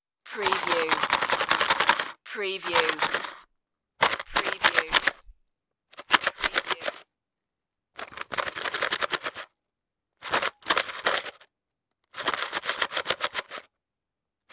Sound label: shaking cardboard box